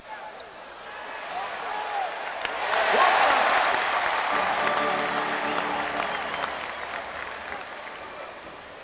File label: basketball game, Ambient Sound Effects